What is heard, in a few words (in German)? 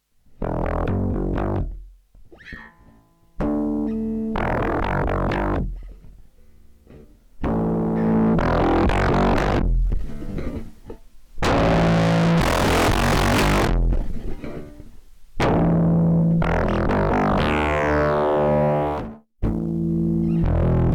Wavefolder Vergleich Doepfer A 137 1 E Bass